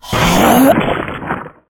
regurgitate, fox male

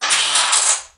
hagar load, weapons

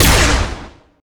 crylink fire, weapons

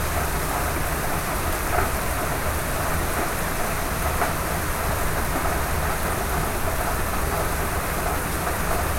rain loud

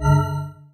relic effect

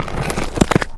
impact stone

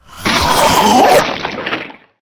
regurgitate, fox male

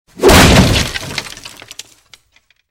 impact wood

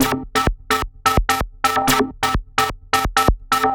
house technik synth 128bpm

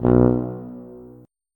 tuba note

